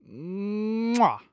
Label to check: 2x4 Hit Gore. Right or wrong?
Wrong